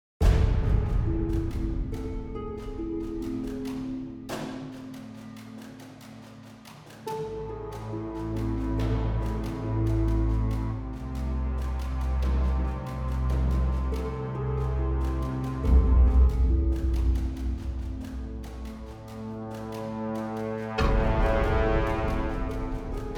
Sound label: Cave Suspense